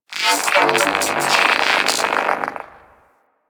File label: Gas City TV noise; Ambience; Props; Warframe; Corpus Gas City; Props Remaster